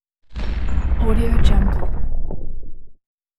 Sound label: low fire destructing explosion; hollywoodedge